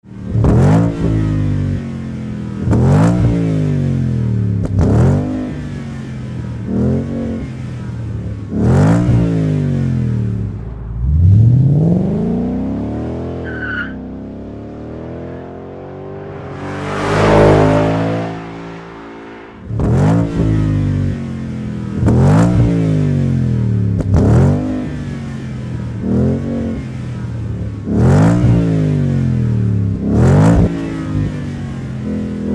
magnaflow street series exhaust system moderate sound